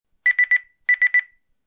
timer 3 beeps

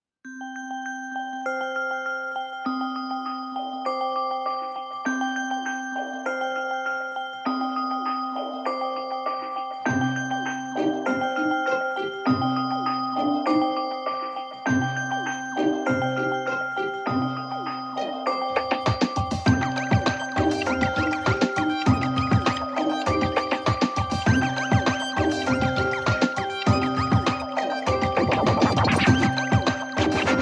zte

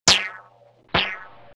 rubber band

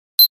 in call notification

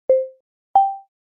ios18 dictation start stop; Системные звуки и уведомления iOS 18 (iPhone 16, 16pro, iPad)

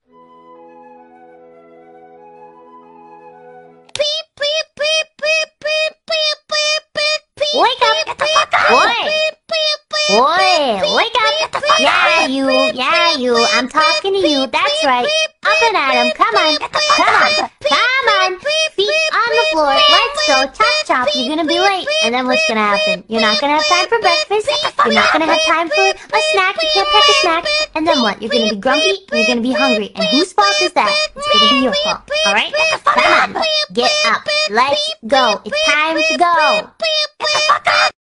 anime alarm

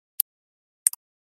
galaxy watch lock unlock

